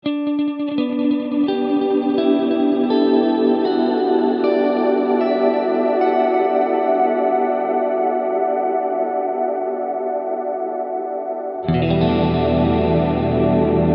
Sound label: 12bit